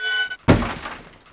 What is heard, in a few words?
shutter, ambient